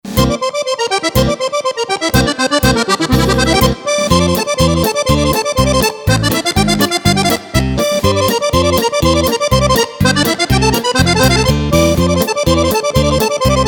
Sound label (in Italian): Suona la fisarmonica